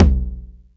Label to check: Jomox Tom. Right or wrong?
Right